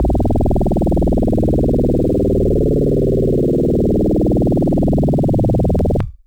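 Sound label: Electric Bass DX100 D#2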